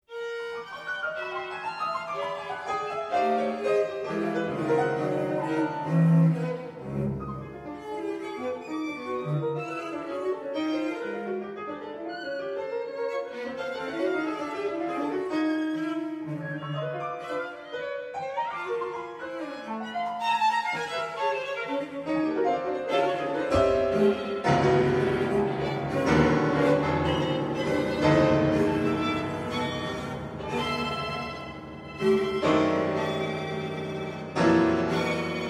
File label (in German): klaviertrio